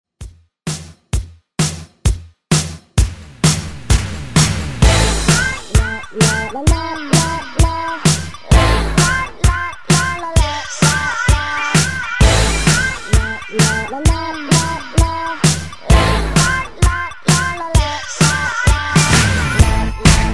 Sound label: Re Drum 130Bpm; DJDOLLS